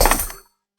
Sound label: sound item pickup